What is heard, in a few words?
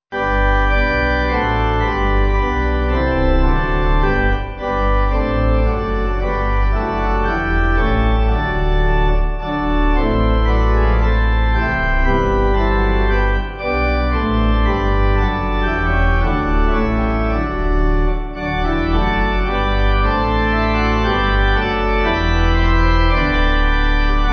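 Pipe Organ, Music Backing Files for Churches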